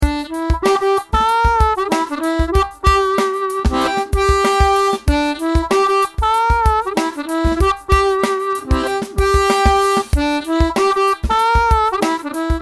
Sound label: harmonica riff normal